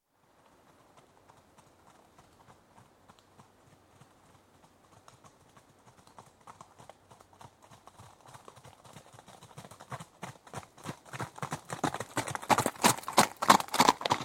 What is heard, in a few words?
Two horses pass at trot on gravelly track, left to right, slight wind noise; Horses Trotting; BBC